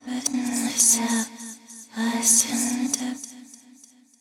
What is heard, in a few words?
Over Processed Female Vocal